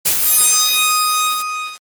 rough distortion

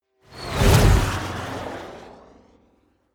flyby

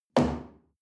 chair wood place medium

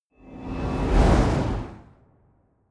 whoosh

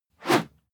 whoosh whistle hard

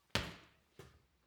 punching bag kick medium

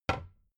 cabinet door close soft

